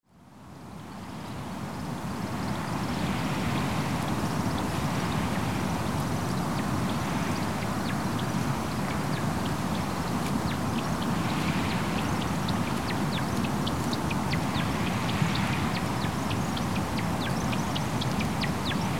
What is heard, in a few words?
woodcock; Woodcock by the sea; smallsounds